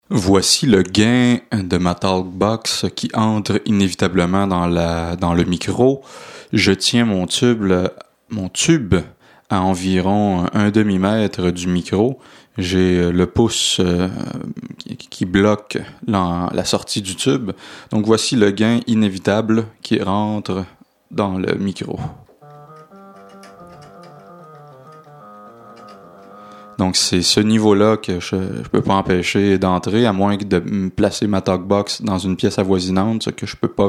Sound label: subtractor talkbox; comment fabriquer sa propre talkbox